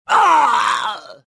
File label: death; Star Wars Jedi Knight II Jedi Outcast; XBOX; bespincop